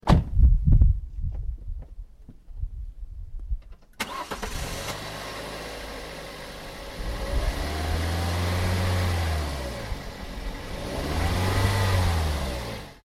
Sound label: car